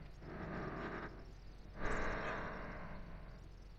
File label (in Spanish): RESPIRACION DORMIR; Yesterday Origins